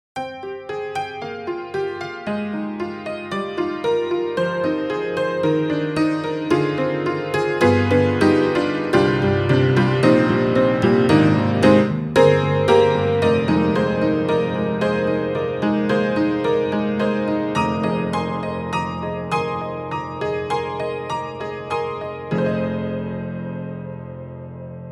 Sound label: Upright Pianos Barroom Upright Bargain Basement; Synthogy; Ivory II